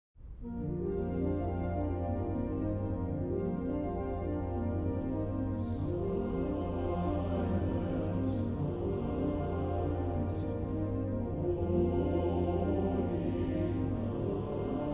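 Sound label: Choir Silent Night; The Mormon Tabernacle Choir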